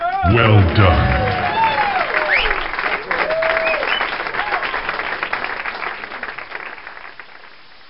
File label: zombie; cstrike